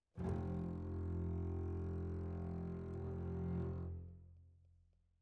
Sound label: Cbasses